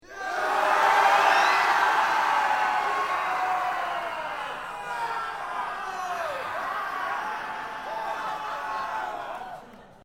ROAR 500, Crowd; The Audience at CGBC 2009 make some noise for me; Crowds (Sound Effects:Backgrounds)

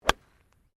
GOLF DRIVE IRON; Various Golf sounds; Sports

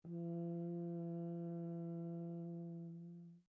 HORN E4 P LONG STOPPED, French Horn in F; Alexander French Horn in F; Horns (Sound Effects:Musical)

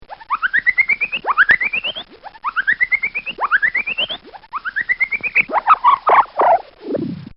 Comical Sound Series Whips; Funny Sounds

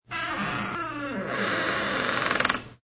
Creaky Door, Long slow door creak; Miscellaneous Doors (Sound Effects:Doors)

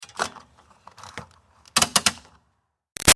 Car Highlander Gas Lid Mvs Tighten; 2005 Toyota Highlander V6; Cars; Vehicles

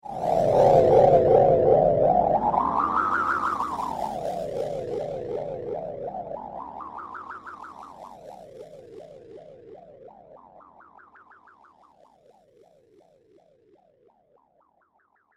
Wow And Flutter Whoosh

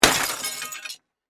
Impact Mirror Tall, Stand, Smash; Miscellaneous Impact; BlastWave

